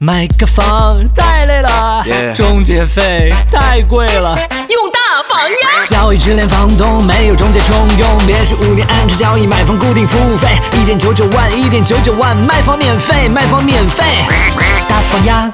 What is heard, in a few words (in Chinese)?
说唱